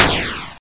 Missile Away; Super Maze Wars Demo

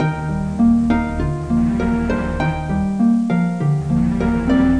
harp evil; Education Publishing Concepts

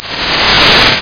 Missile Launch; Kongbots 1.0; Strategy

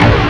big psionic; Odyssey

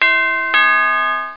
doorbell; kidsplay

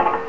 clank

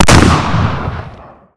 shotgun fire, weapons; Spearhead Demo

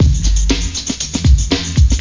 breakbeat; World of Sound; instruments; beats

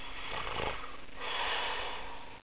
Snoring; Death Blade

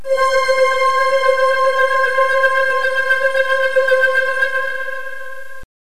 fx high c4; Terra Sound Library; construction set

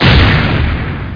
BOMB; Uprising

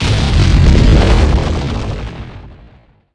Empty Recycle Bin; Shogo

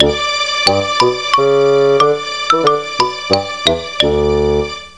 goofy slow; Blender Magazine volume1.3 1994

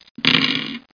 roll

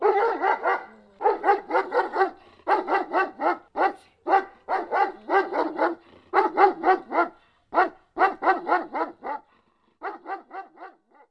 dog bark; Media-Pedia; animals

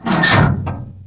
iron maiden, items; Heretic II Demo

